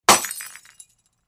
Light Bulb Smash

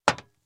FS Loafer On Metal, singles metal

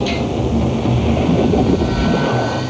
Torpedo Jet By, torpedos

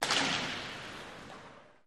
Ice Hockey Hits Impac

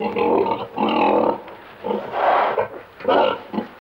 Pig Grunts Agitated

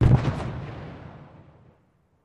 2nd Car Blow Up 4 Pipe

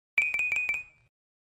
Xylophone Shrt Trill, mallets xylophone

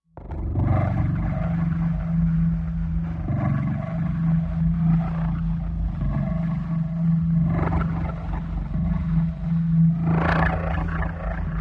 Crock Snore Low Breath, alien ambience

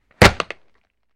Football Catch Gear, football

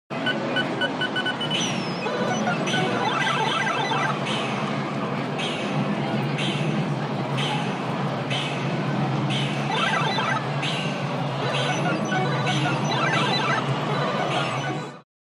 Video Game Arcade; Close Up Beeps & Tones

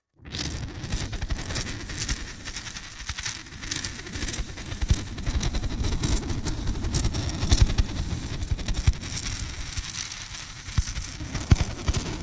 White Noise, static

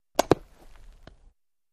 Baseball Bat Alumin; baseball fx & games

